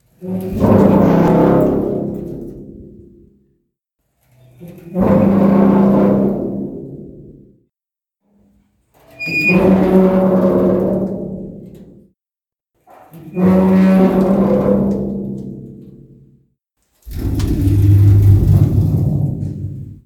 Metal Door Creak

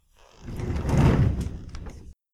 Office Chair

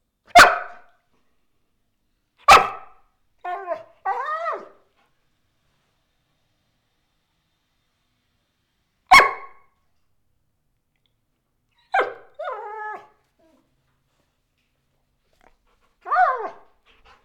Dog Whining

